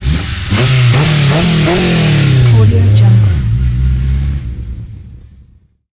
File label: rally car medium revving slow passby